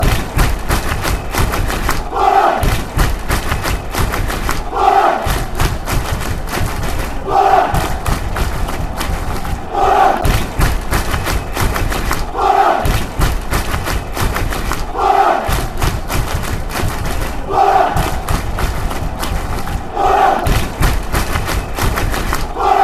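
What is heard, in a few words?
boro clap, football songs and soccer chants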